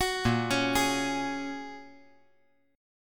A sharp Minor 7th Sharp 5th A sharp, 5G,4C,5E,5A; arpeggiated; 11,10,9,11

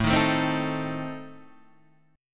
A Diminished A down Guitar Open D

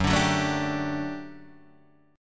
G Major 7th Suspended 2nd Gb

